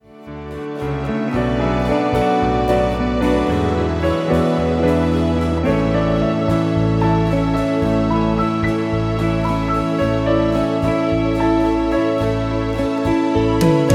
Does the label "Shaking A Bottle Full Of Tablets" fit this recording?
No